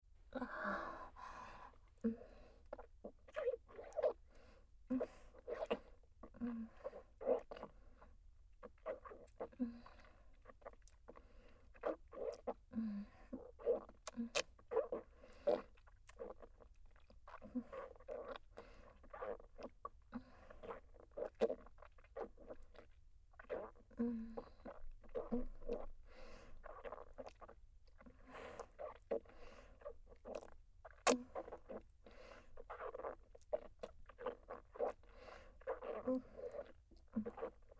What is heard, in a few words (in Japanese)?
事務的ビジネスフェラループトラック, ASMR; 奴隷エルフ姫